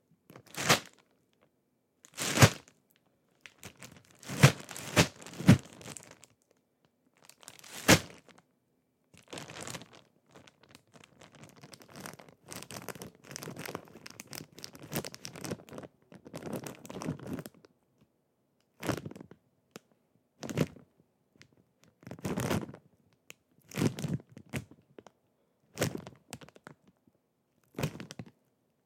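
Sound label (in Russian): жесткий пластиковый пакет полный стяжек толкается вокруг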